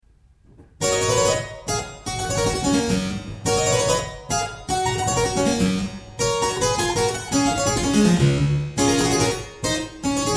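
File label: Grimaldi harpsichord Sonata G major; Scarlatti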